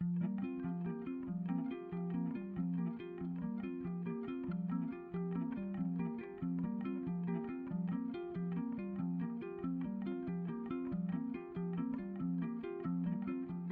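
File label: compound, electric bass; This is a rhythmic compound that currently consists of only two parts; Compound 2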